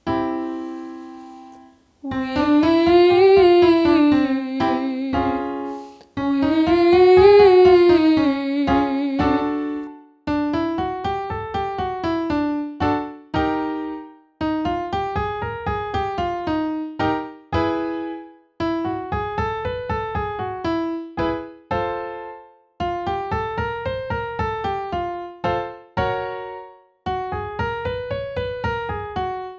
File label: 5 note wee vowel ascending, vocal exercises